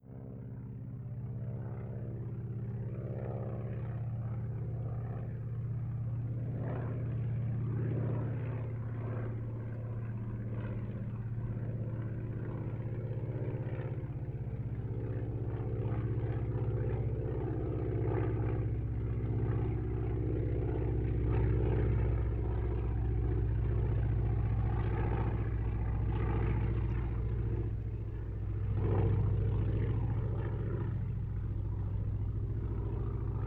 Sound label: Plane Distant; Plane Brossard Distant; Vehicles; Aircraft